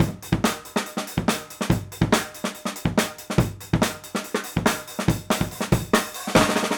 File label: break, loops